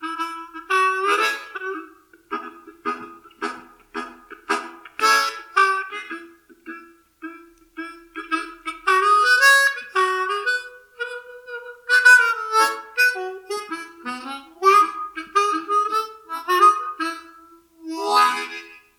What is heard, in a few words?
Blues